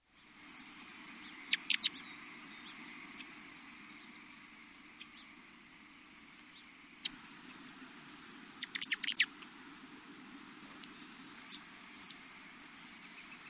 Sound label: rufous hummingbird